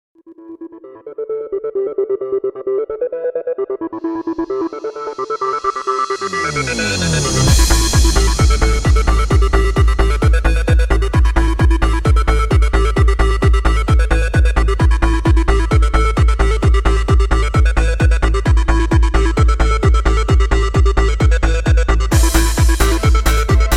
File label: Club Beatz